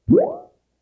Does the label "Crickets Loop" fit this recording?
No